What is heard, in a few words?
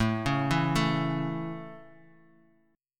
A Major, Guitar; arpeggiated